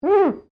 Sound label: GLASS SQUEAK FINGER